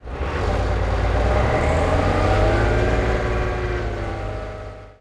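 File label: chieftain engine interior load, tanks; engines; War Thunder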